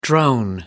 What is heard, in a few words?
drone